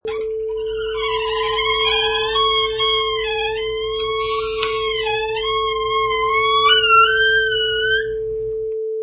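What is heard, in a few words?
Super Creaky Door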